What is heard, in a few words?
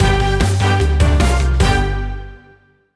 Slime Mania Stinger; Nickelodeon - Capture The Slime